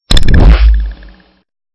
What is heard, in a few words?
Stinger; STINGERS